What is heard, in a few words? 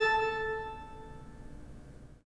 PIPE, Organs; E-MU Producer Series Vol. 5 – 3-D Audio Collection; 3D Pipes Dr Joyce